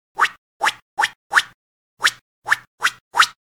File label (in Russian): golosovoj audio effekt "vzmah knuta"